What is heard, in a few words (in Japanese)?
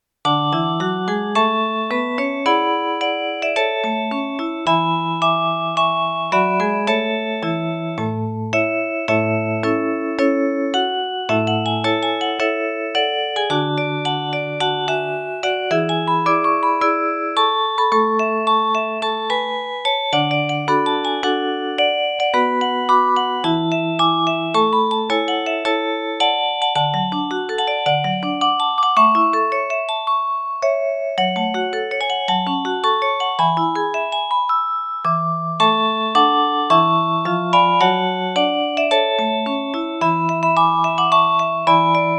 校歌（オルゴール）; 広陵中校歌